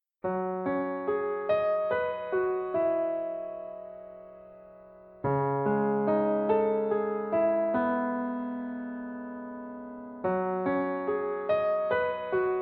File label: bgm